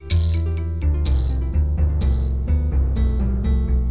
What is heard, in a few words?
music; Egoboo